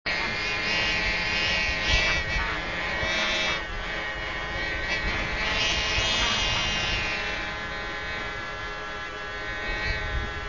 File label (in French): Libellule